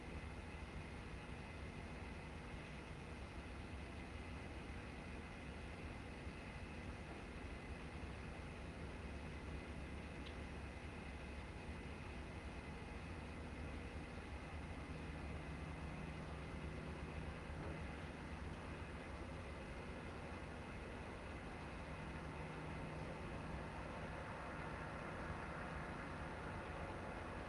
ambience outdoors late night cold winter air pack of canine howling into the night and man; sonniss gdc